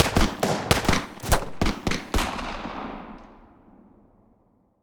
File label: Cold Open Ambush